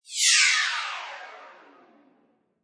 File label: Laser Hit Slow; Scifi Production Elements, Set 1; Lynne Music